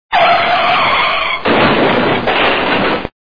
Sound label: car brake crash